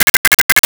Arcade Chirp

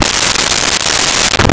Sandpaper Skids; Vintage Cartoon; Foley

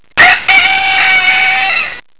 cockadoo

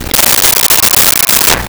LoFi Synthetic Squawk; SONY PICTURES SOUND EFFECTS SERIES

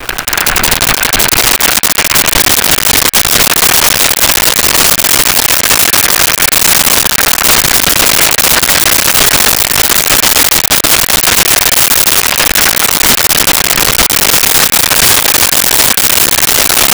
White Storks Clicking; Animals; SONY PICTURES SOUND EFFECTS SERIES

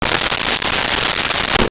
brushing teeth; Bathroom

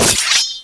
snikt, swords

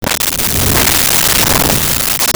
Shuttle Fly By, Science Fiction

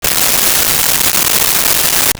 hair mousse; Bathroom

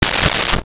deodorant spray; Bathroom

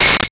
Metal Clang

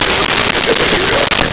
LoFi Gurbles, Comedy Vocals; Vintage Cartoon; SONY PICTURES SOUND EFFECTS SERIES

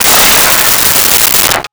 Junk Crash; Crashes; 1001 Sound Effects

